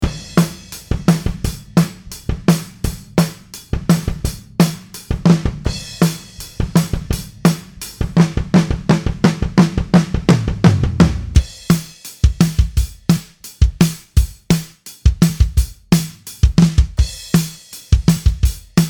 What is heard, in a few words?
drum morph